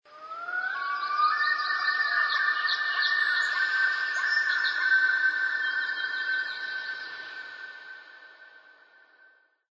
animal call bird loon; Source Engine; tf; lair

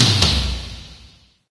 baseball restore up; sound schemes

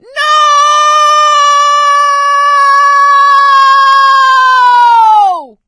fall, survivor voice; source engine; left 4 dead 2; producer

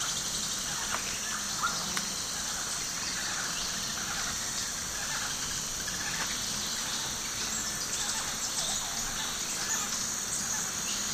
jungle life loop; Source Engine; tf; lair

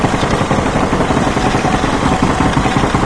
gear loop; ambient; source engine; ep2; outland

